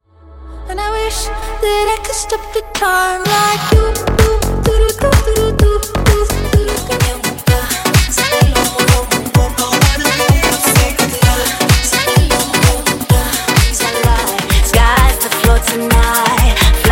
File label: Party Dance Club Megamix, 128 Bpm